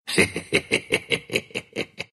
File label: laugh, ru; dota2